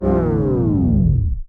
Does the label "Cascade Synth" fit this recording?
No